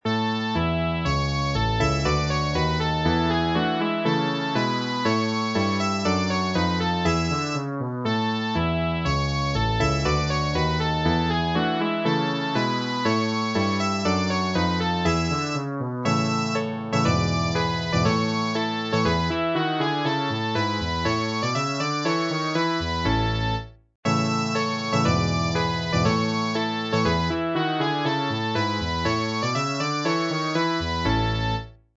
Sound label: spain national anthem